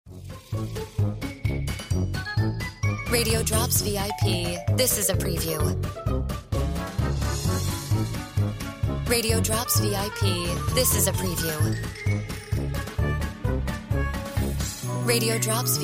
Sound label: Music Bed